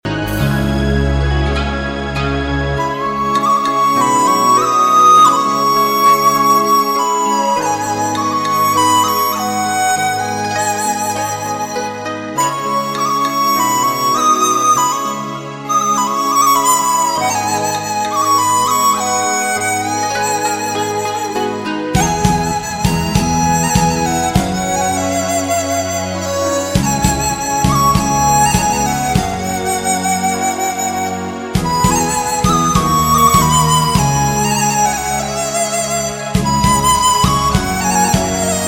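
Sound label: flute sound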